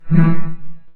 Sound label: light saber